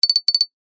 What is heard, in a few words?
cartoon knocking high pitched delicate glass